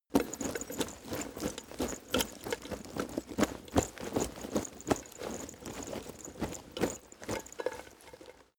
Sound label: leather metal movement hard